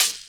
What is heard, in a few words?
Open Hhat, Hihat; Equipped Music; Loungin House